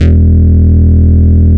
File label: Root E, Bass